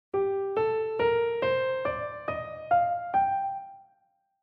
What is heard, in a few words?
G Aeolian mode; key signature